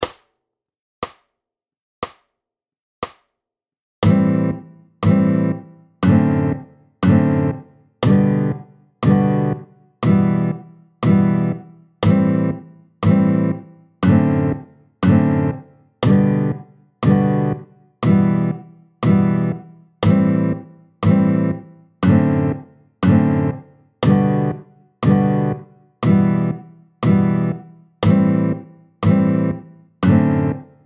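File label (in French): tempo 60, Anatole, tempo 60, guitare; Toujours en SOL (1 # à la clé) : LAm7 RÉ7 SOL7M MIm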